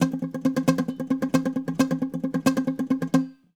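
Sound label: bouzouki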